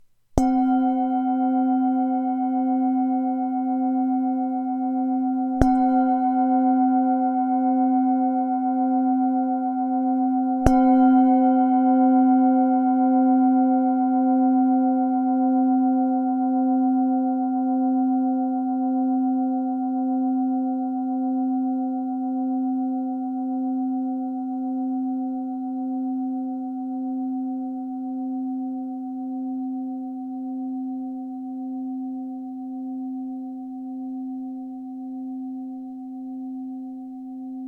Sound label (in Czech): matna tibetska misa